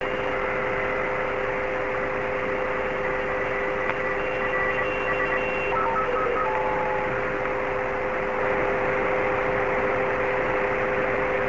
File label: suitsat sstv